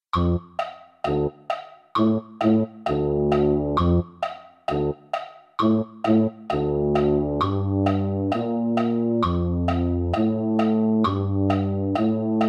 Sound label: christmas classics medley tuba; holiday brass